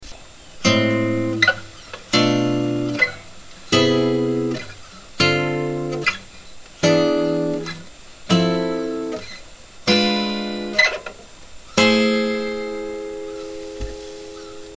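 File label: Harmonic Minor, guitar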